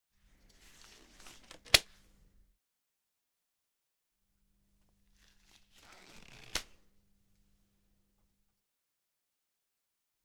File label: Elastic Glove